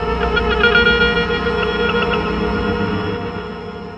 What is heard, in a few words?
guitar breathy drone